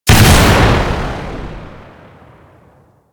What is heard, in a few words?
Bow Second Explosion, Weapons; Warframe; Corpus